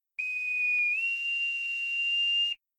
boatswain

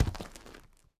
asteroid, Footsteps

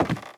wood, footsteps; civ13; civ14; Civ Effects

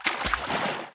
slosh, Footsteps Water

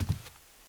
carpet step, Footsteps

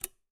lightswitch; civ13; civ14; Machines

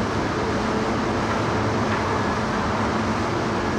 atomic turbine exterior loop; Machine

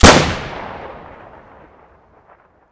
Kar98k, Guns Fire; civ13; civ14; Weapons

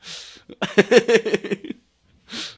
man laugh; Human

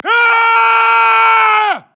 male generic warcry

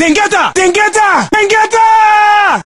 charge, Voices Emotes

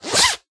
jumpsuit equip

